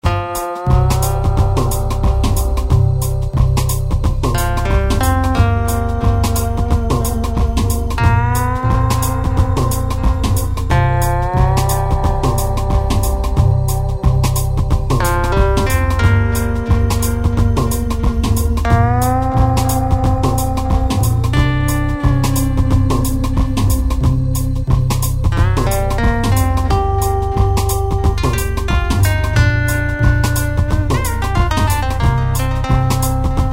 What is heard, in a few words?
Space Cowboy Shuffle; dreamy; Music for TV Film Multimedia